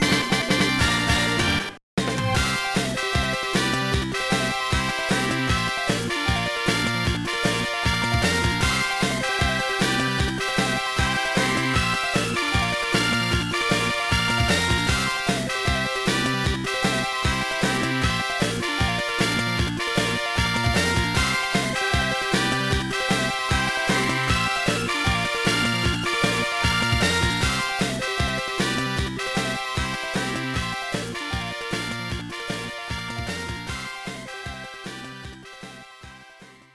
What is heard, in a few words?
Battle Result; Yggdra Union We'll Never Fight Alone; GBA